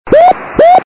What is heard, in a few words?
cw; CW beacon / signal received by DK3WN on October 19th / 2001 13:45 UTC.